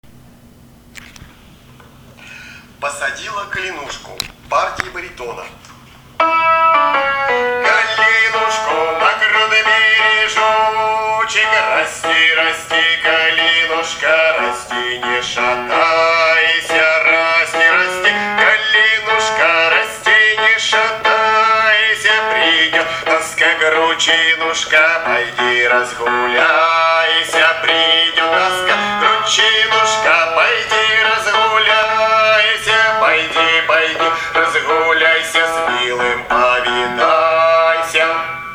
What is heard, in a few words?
Baritone; Posadila Kalinushku